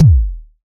low kick drum single shot F sharp key, Royality free kick one shot tuned to the F# note